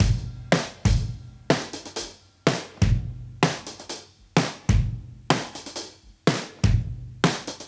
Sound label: Modern Breaks; Loops; Touch Loops; ClassicBreakSessions